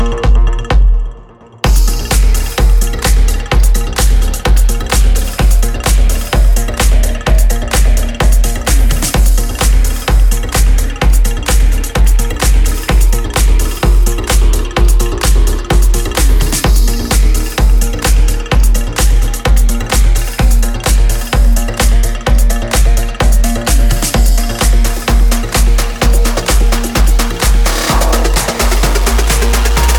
The Beat

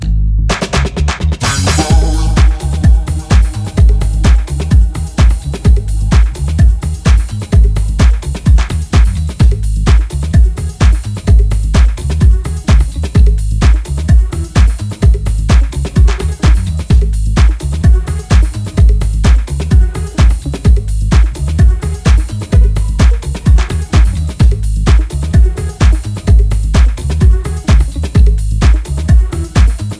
Disco Bomb

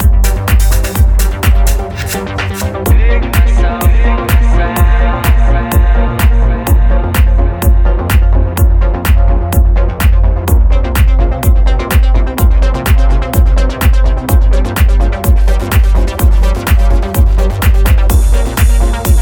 Deep Underground

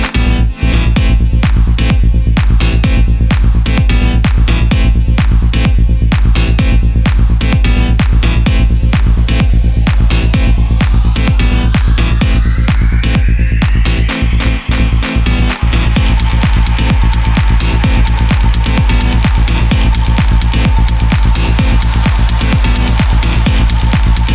Trance Beats

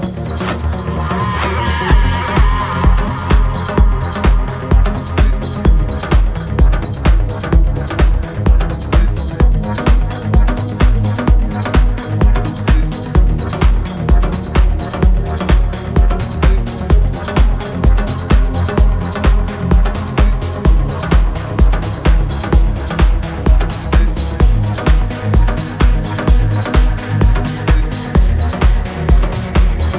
bass

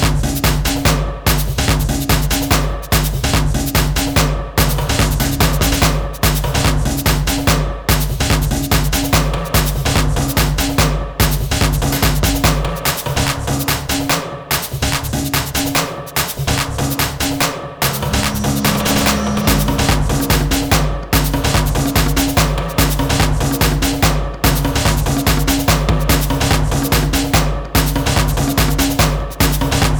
Beats

